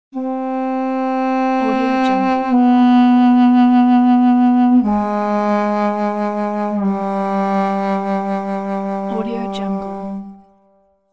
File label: snake hypnotic flute